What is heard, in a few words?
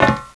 player metal; xash3d fwgs